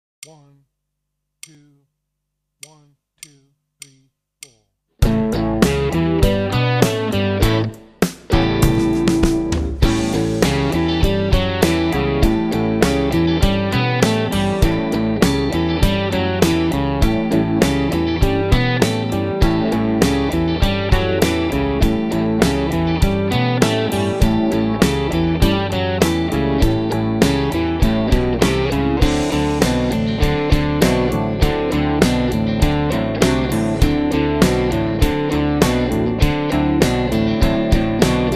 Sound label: Backing for Lead; Play Rock Guitar - Ed Wennink